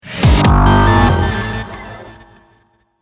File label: deathcam